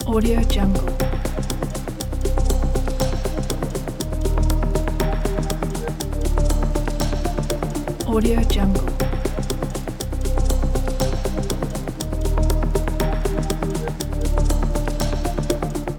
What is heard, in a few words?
thinking time quiz show loop